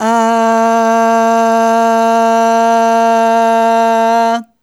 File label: Aah Long A#2 Dry; Harmonic Building Blocks